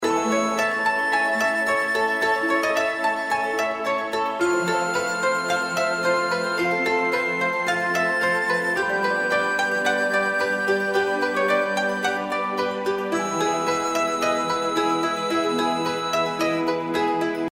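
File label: outro background music, fortune god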